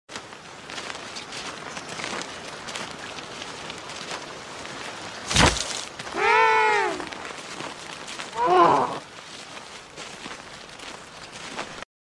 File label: penguin falls down